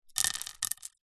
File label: small stones handful drop onto paving stone